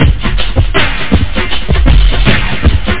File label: breakbeat; Rhythm